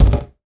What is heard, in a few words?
Box, household rhythm samples Hits